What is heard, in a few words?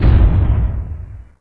soil dirt